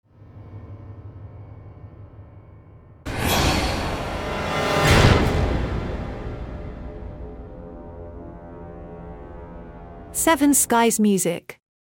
Scary sting

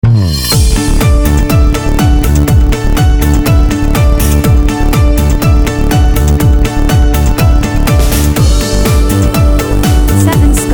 Urban TV Theme

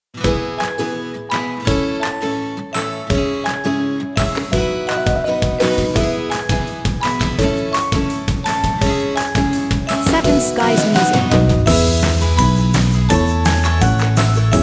Cheerful Promo

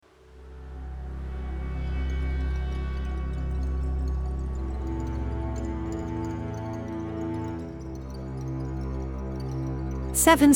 Orchestral - Slow Anxiety

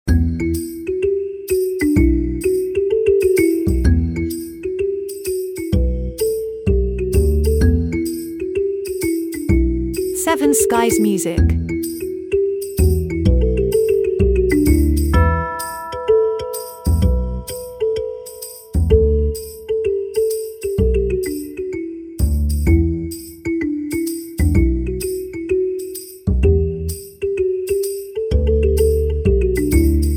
Pirate Loop

